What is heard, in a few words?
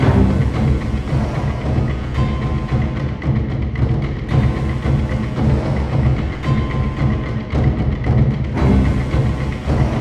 Music Fight Vikings Theme Hard Loop; asterix obelix slap them all 2